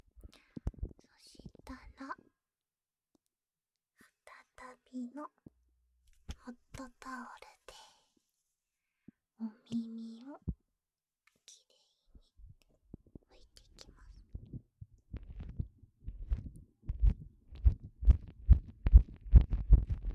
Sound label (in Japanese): ホットタオル, ASMR